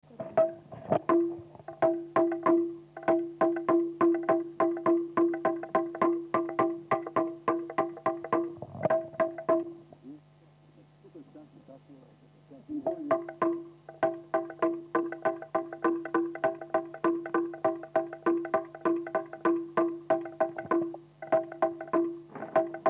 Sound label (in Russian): Наигрыш на барабанке; folklore